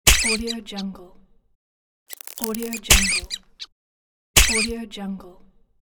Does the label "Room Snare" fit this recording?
No